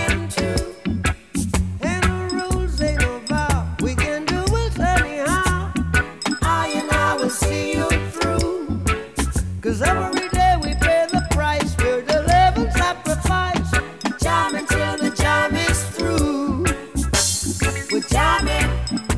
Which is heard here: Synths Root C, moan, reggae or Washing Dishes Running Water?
reggae